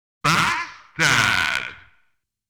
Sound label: Book of Death acquisition sound; dondokodon